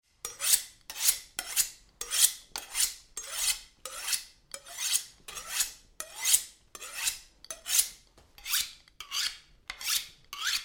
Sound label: Knife Sharpening on Steel, Various sharpening move; Miscellaneous Household